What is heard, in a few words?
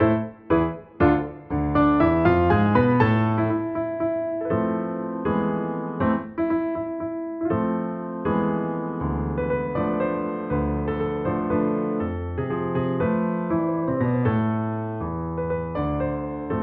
piano